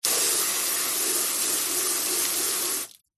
vehicles car water jet spray from hose on wing side mirror ext